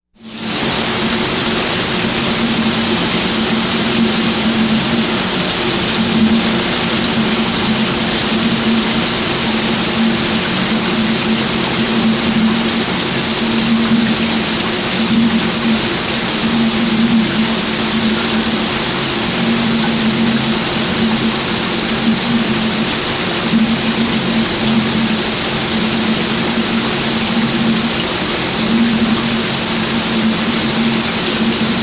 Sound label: household shower running; sound effects one